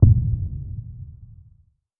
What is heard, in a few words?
boom